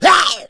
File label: smoker attack; voice